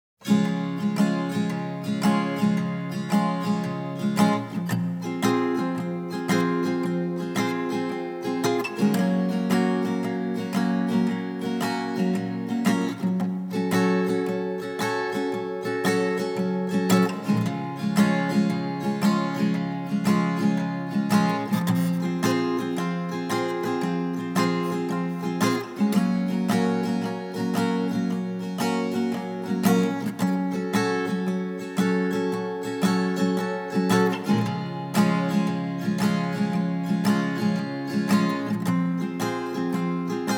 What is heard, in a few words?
Instrumental